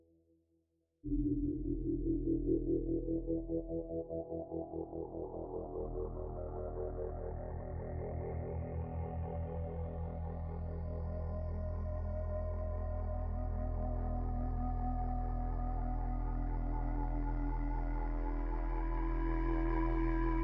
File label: Happy Pad II